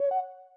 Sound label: chat outbound